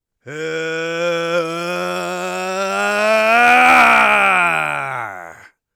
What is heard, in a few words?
magic attack, Mage Voice Pack